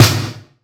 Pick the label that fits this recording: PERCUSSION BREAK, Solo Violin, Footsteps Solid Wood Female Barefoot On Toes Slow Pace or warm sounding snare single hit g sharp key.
warm sounding snare single hit g sharp key